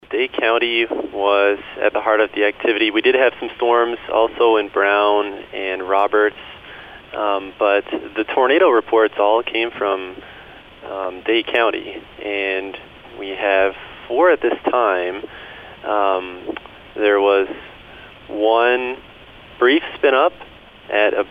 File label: Tornado; Bristol